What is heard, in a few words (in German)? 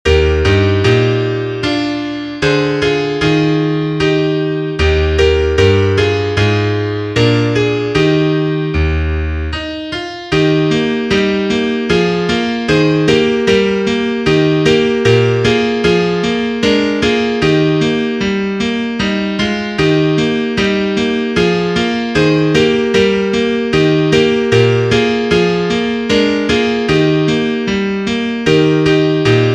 Klavier; weisst du wieviel sternlein stehen